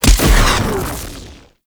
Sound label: Bow Arrow Rain Explosion B; Ultimate Abilities; Madurai; Warframe; Focus